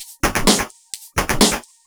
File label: Electro Loop 128BPM; Vengeance Samples